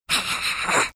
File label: laughing like muttley